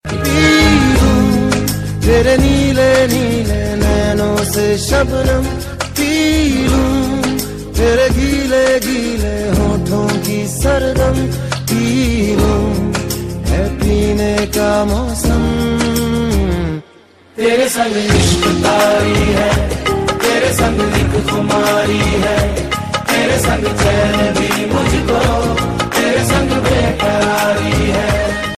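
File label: bollywood ringtone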